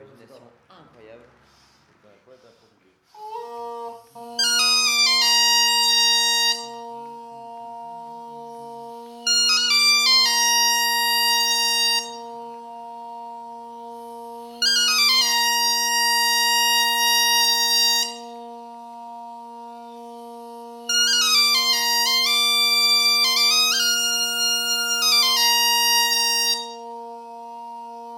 bombardes biniou; festival

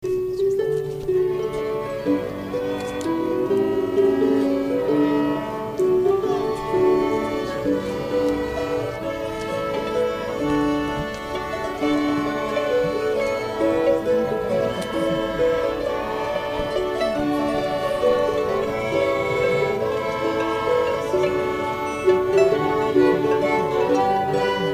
mazurka harpes violons; charivari

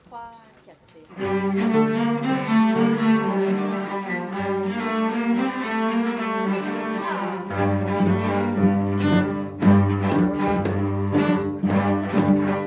violoncelles; festival

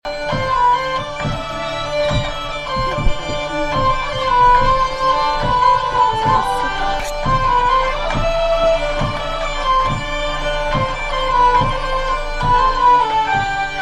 avant deux melodie phrase, vielle

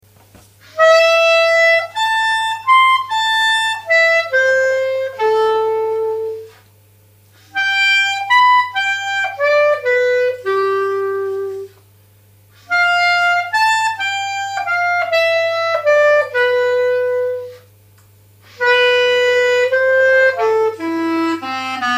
mazurka phrase 2 decomposee, clarinette

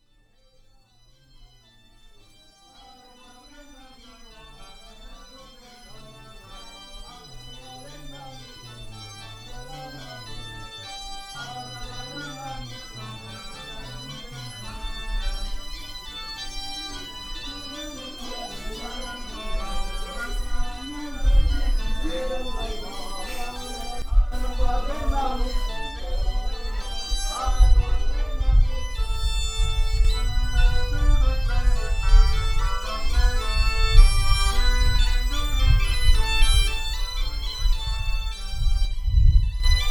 defile carole chantous; charivari

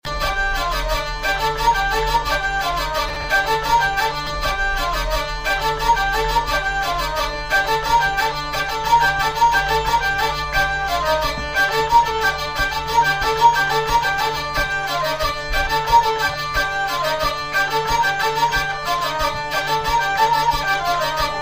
ridee 6 temps, vielle

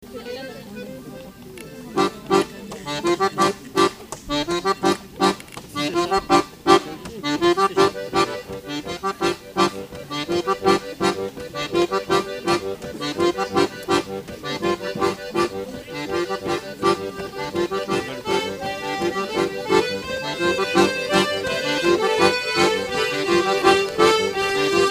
andro accordeon; charivari

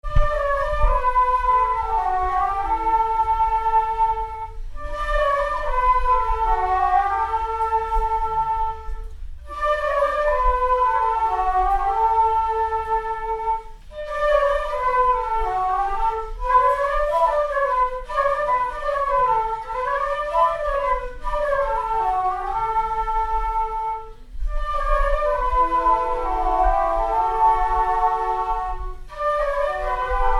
bal plinn flutes violons; charivari

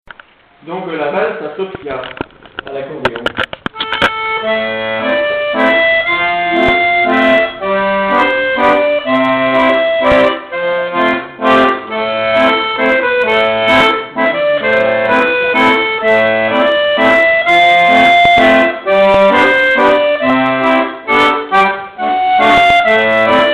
valse accordeon

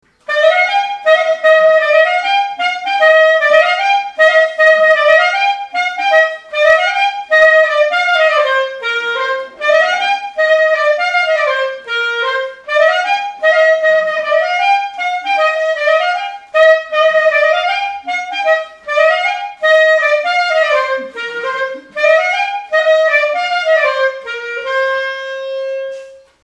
rep ronde courte et seche, clarinette

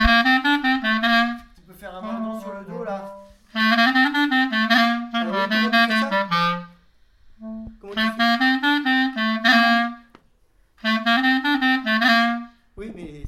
fisel mordant do, clarinette

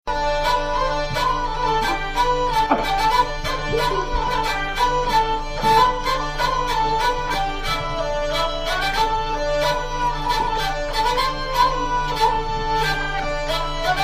passepied, vielle; landeritrao

